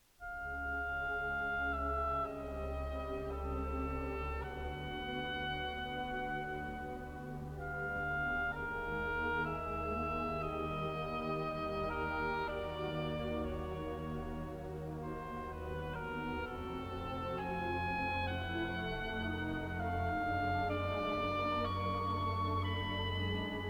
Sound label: Symphony No 1 in F minor; Shostakovich; The Philadelphia Orchestra; Great Performances